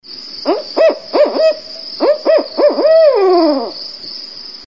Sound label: barred owl call ringtone